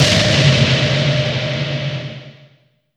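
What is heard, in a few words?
BIG BLAST, Impacts, Hits & Booms; Distored Reality 1&2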